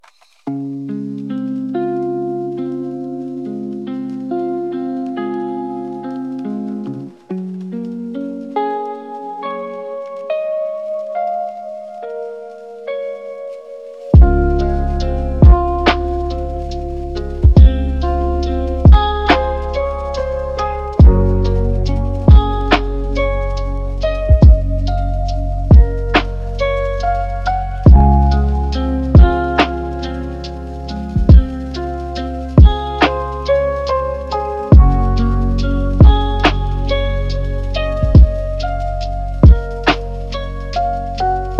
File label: lofi